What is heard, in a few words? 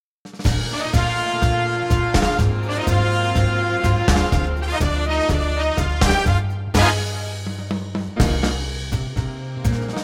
tango